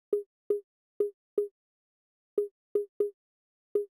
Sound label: Conga; hydrogen